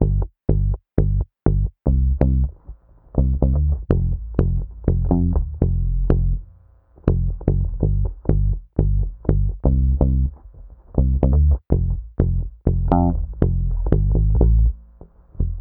BASS

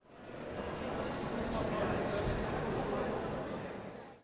crowd Chatter theater; spellbound

